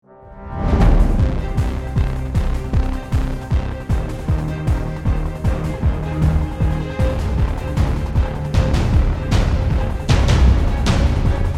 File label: round ten sec count, music